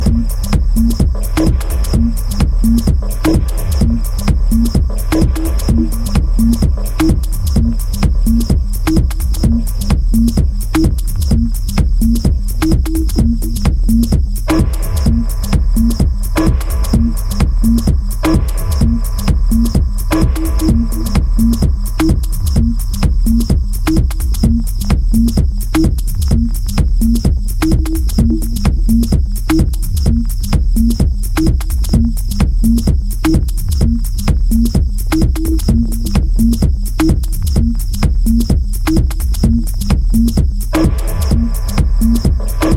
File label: Dub Jazz